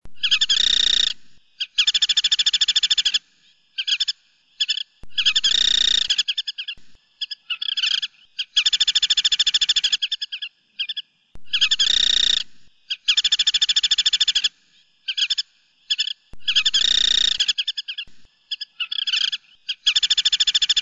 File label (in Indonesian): terik asia